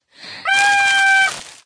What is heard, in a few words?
Trumpet